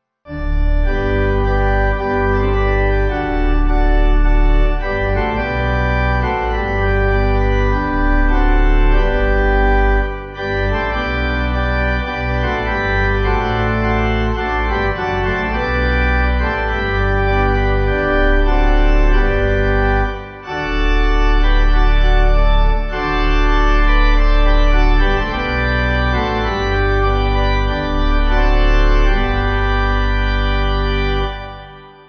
Pipe; Awake My Soul

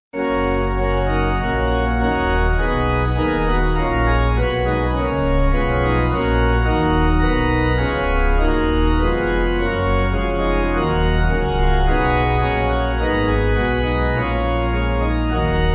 Pipe

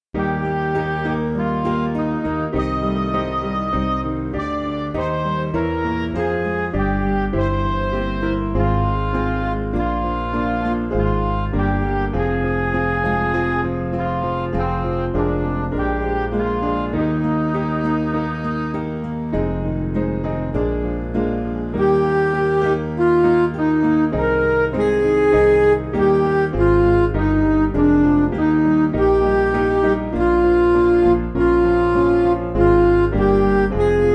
The Birds In The Forest, Midi; Mendelssohn

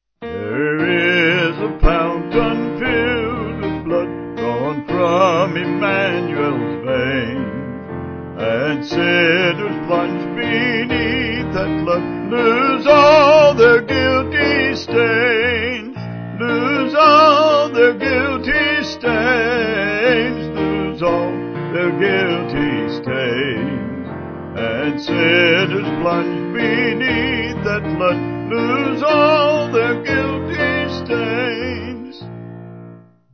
Vocals Piano

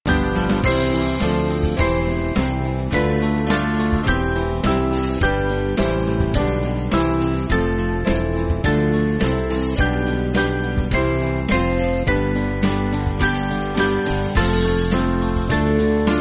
Band

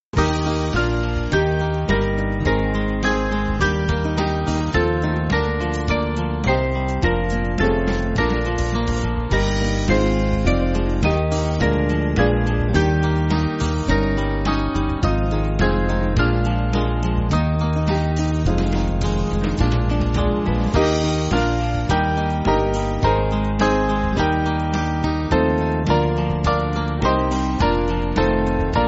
Band; Jesus With Thy Church; St Katrine

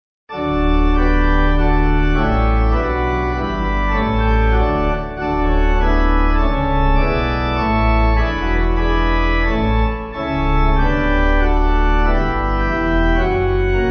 Pipe

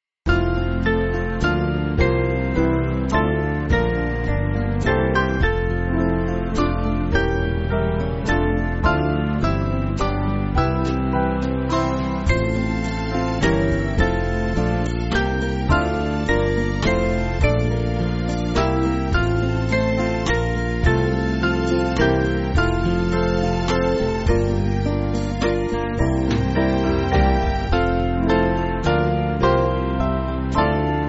Band

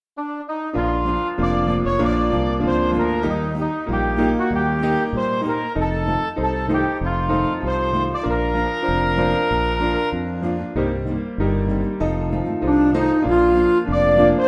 Isn't He Wonderful, Midi

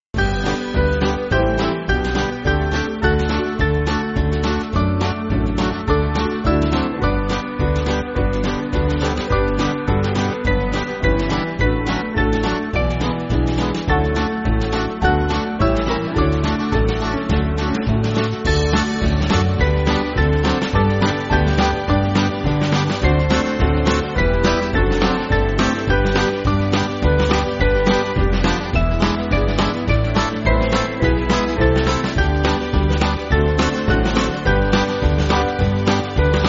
Band, Free Music Backing Files for Churches; I'm But A Stranger; St Edmund; St. Edmund (Sullivan)

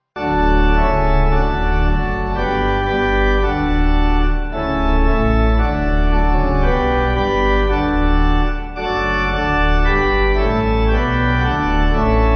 Would You Know, Wollt Ihr Wissen, Pipe

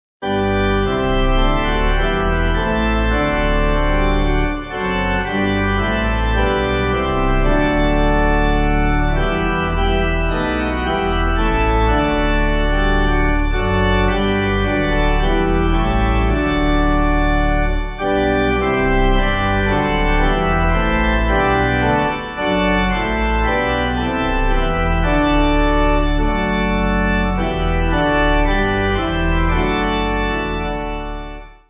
Pipe, pipe organ; 7.6.7.6.D; While Morning Still; Lob Gott Getrost

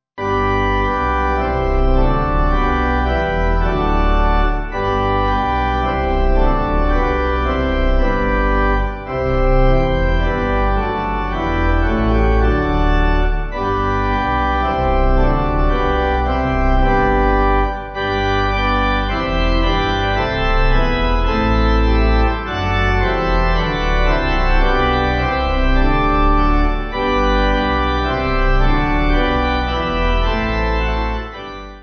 Songs Of Praise, Pipe; 7.7.7.7.D; Organ; Riley

